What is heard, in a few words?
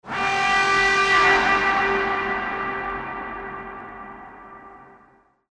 Defense Alarm; Corpus